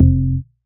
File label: DM ORG1, Dance Mega Synth